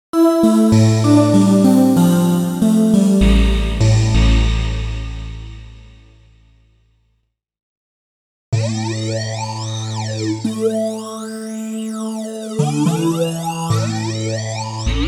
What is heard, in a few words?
yamaha ex5r sounds, fdsp flange, choir raw and flange